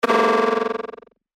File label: PERC G Chewbacca, percussion; revealed percussion vol 2